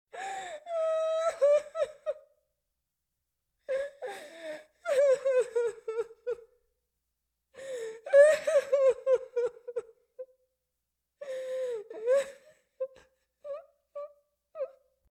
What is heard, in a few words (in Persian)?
zar zar, زار زار گریه; صدای گریه